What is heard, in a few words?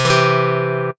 chord C